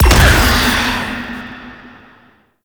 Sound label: Ship Cannon Fire, Flak Cannon; Warframe; Railjack; RailJack Weapons; Ghost Ship